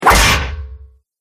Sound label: War Hammer Smashes Armor; Impact Sound Effects